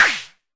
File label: Arturia MiniBrute sn